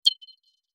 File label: Modular UI Solo Beeps